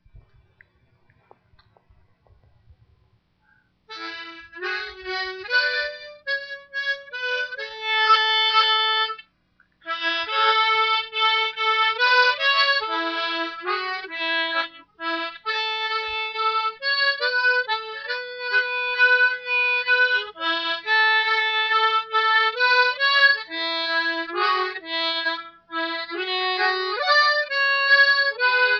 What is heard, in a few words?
harmonica; sambika seika